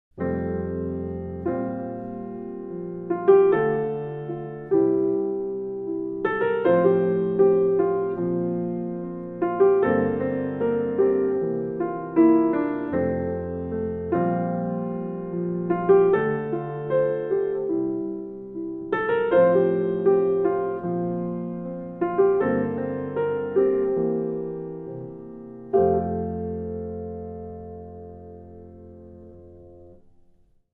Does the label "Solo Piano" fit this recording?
Yes